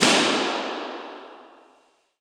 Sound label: North Stairwell, Impulse Response; CPMC; EchoThief Impulse Response Library